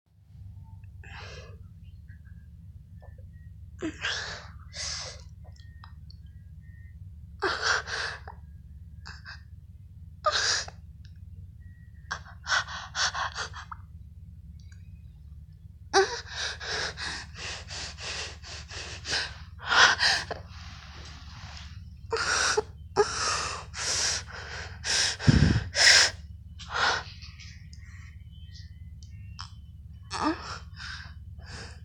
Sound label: Just a lot of noises